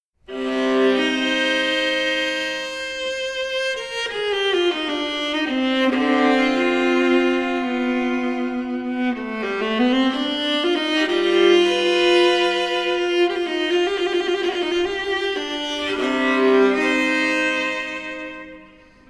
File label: viola Sound Sample; Model 1